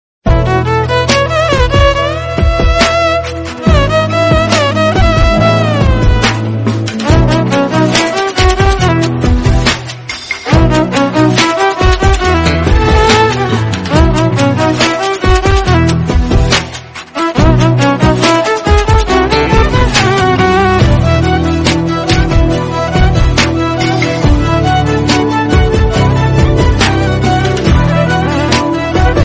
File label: Instrumental, Ringtone; Geetha Govindam